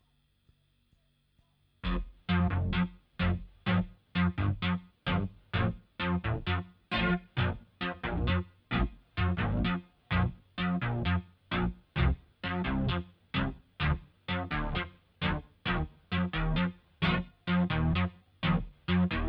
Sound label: Synth Prophet Bass Intro